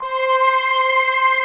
future choir, Stack; Optical Media International; Sonic Images Library

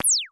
FREQ ZAP, Dual Percussion Synthesiser; Klone

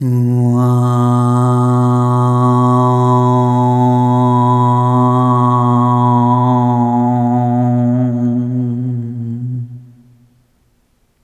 Arrhythmic B; Sonic Foundry (Sony Creative Software)

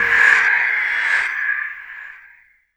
X MACH, MACHINES, Production Effects

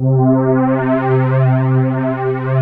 JUNO STR; USB Soundscan vol.49; Analog Fantasies; AKAI

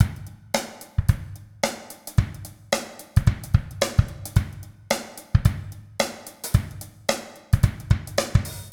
110, LOOPS; Best Service; Hyper Dance

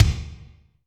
Kik Thud Hall, Drum Machines Alesis D4

